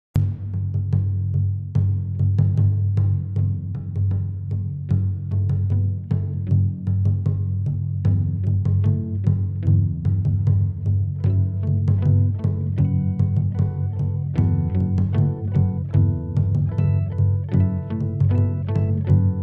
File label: chime; Written to accompany a commercial for a local martial arts school. Uses indonesian drum, 8-string bass, and guitar harmonics